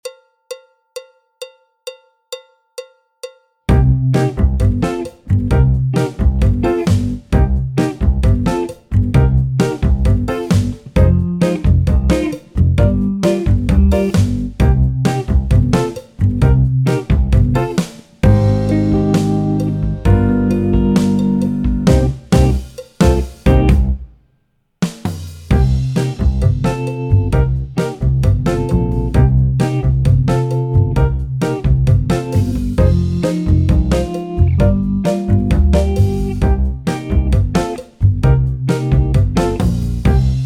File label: Doin' the Samba BT (tenor, clarinet, flute, trumpet) Extended; Jazz Syllabus Level 2